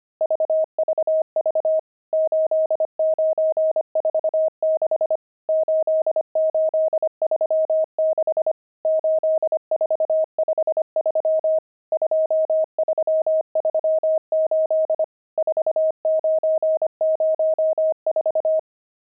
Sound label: pangram, intermediate pangrams files